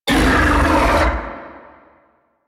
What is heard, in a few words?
Octopede Pain; Warframe